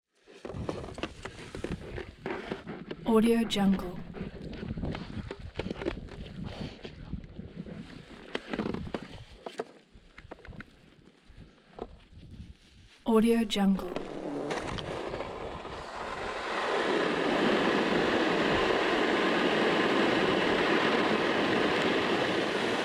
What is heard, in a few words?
sled movement on squeeky snow; collanderson